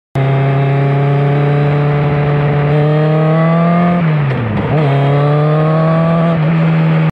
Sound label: t rex motorcycle; موتور تی رکس